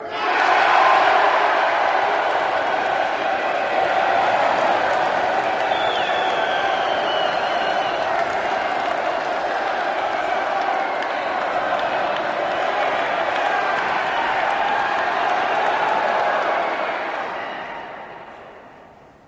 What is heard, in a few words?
Crowd Ohh Reaction, big arena